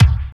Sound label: KICK, Tekno Industrial; East West